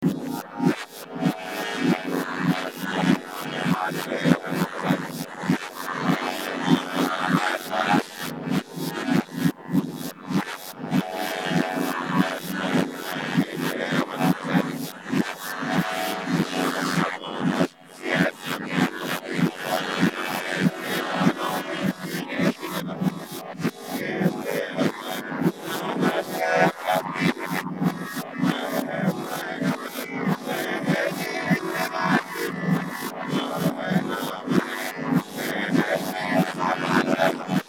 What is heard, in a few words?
ensemble